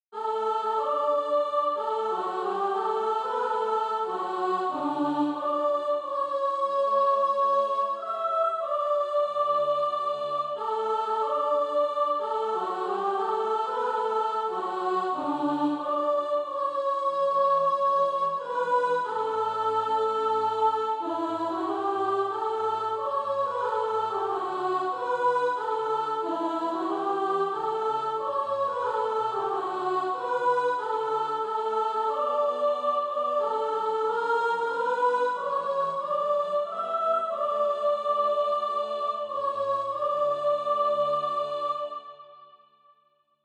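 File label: soprano, Practise Music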